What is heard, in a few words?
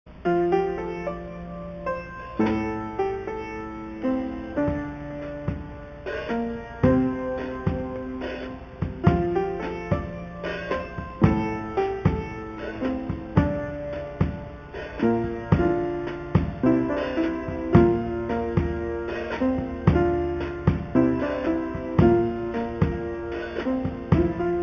Burning Coals rhythm